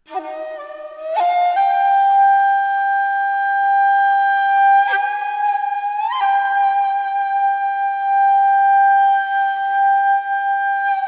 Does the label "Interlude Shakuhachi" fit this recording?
Yes